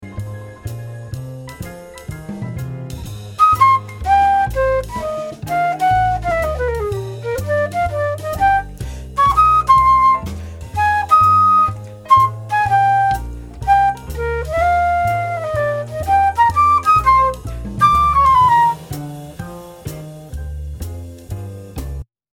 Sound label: Dim Chord